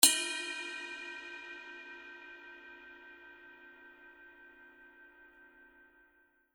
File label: formula 602 ME 24 ride bell; paiste; modern essentials